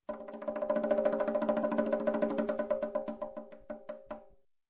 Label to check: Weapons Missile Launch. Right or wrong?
Wrong